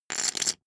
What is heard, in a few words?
poker chip stack rattle